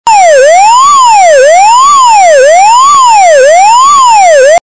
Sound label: tone